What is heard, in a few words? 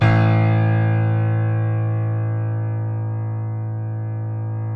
A0 PIA; Northstar - New Gold VOL-2; BOS.GRAND